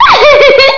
LAUGH; psion cd vol1

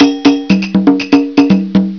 Samba Drum

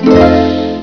HARP; psion cd vol1

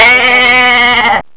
SHEEP; psion cd vol1

